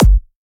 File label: Dr Kick